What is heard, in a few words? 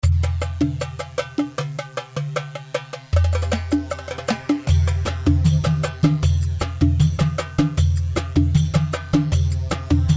Shakshaka 4 4 155, khaleeji; Shakshaka 4/4 155 شكشكة